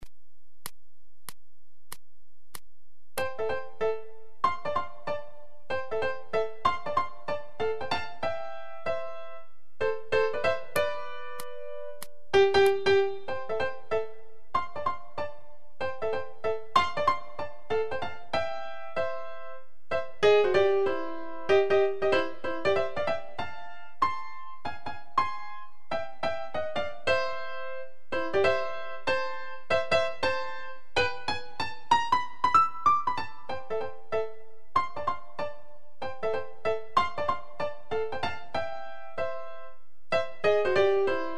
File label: Foxtrot 95